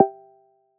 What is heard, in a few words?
face unlock success; HAPTIC SEC FACEUNLOCK SUCCESS; Sharp; AQUOS sense4 lite SH-RM15